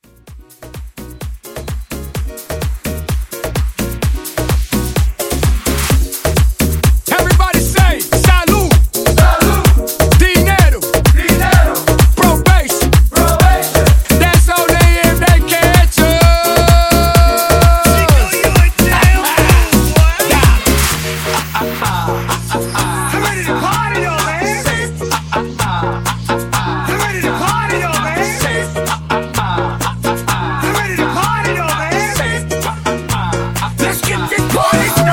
Latin merengue, 128; Mashup

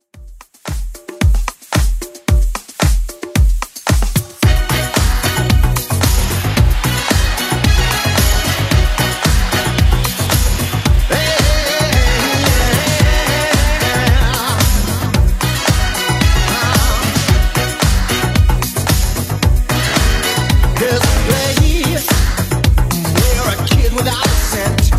80s Club Edit 112bpm

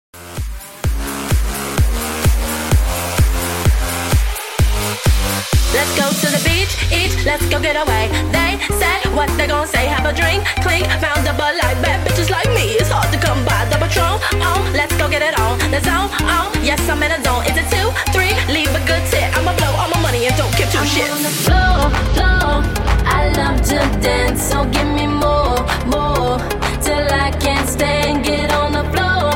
Bigroom 128Bpm

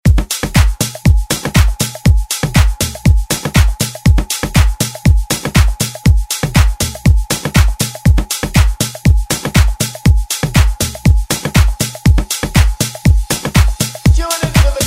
Dance Afro Redrum

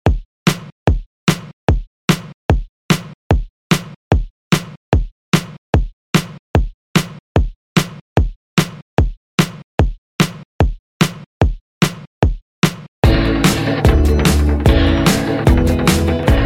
Funk Grid Redrum 148Bpm

